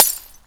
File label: shatter